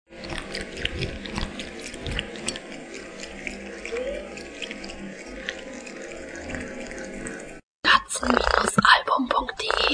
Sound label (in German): Kater trinkt; katzen-album